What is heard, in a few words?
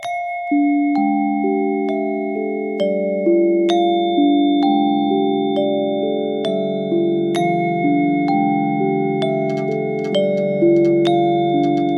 Glockenspiel & Chimes, Hapi Drum & Glockenspiel